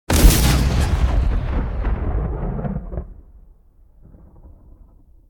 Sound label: missile launch; Original TK Sounds